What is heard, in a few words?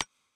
click